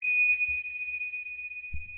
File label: sonar Ping Suit Far Directional; Barotrauma; Real Sonar